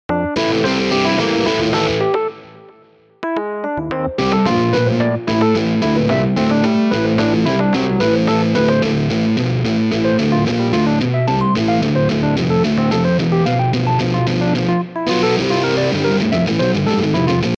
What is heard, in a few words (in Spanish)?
musica